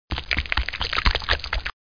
squishy; DR BRAIN; BUGS

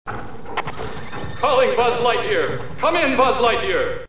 System Default; Buzz Light-years Away II

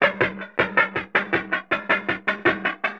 PERCLOOP D+B 160, Drum+Bass Loop; eJay

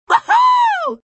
whoohoo; mid flight

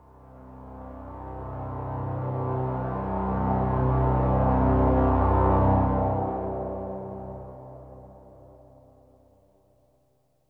synth pad ambient 160 C; Sphere

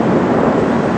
ear wind; Redline

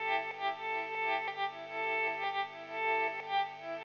ELEC GUIT ETHNO 125 A, Guitar; eJay

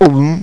swallow

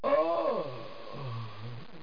groan; Transcription: / Oh!

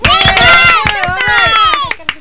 clap; phonidos

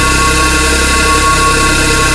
Beam Loop; Phoenix

